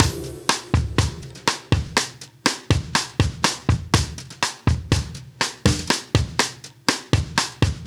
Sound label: 122 live breaks